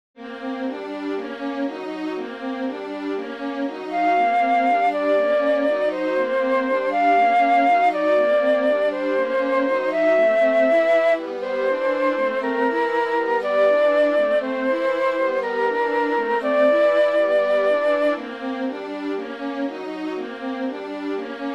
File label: Small piece for Flute Violin Viola